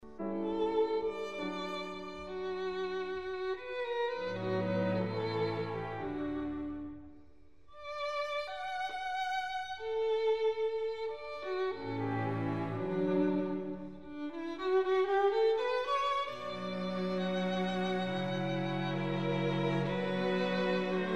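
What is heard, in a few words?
piano sextet; bennett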